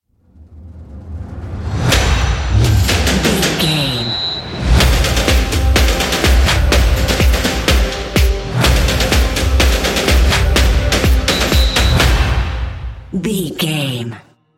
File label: football march